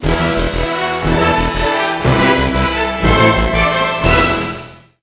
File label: Song victory